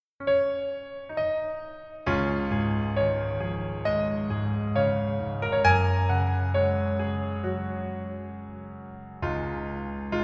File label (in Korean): Piano ver, -1키